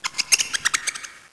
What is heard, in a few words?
rat idle